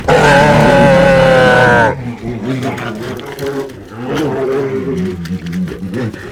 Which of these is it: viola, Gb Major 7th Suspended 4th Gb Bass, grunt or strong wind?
grunt